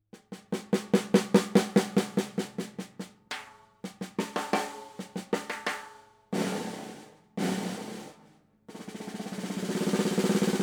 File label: Ludwig 5x14